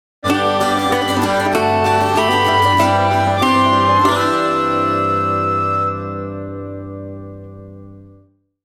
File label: sting